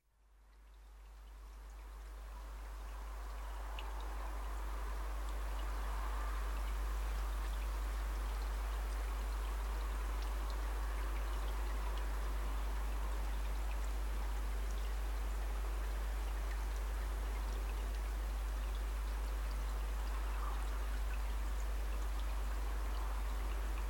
Midnight Traffic and Water

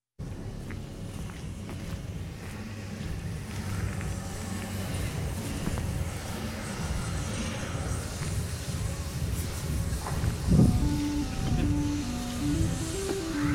Amusement Park With Ghost Train

